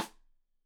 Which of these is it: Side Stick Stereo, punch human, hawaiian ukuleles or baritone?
Side Stick Stereo